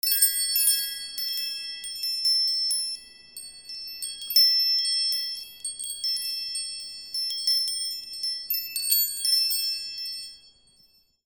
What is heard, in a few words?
BELLS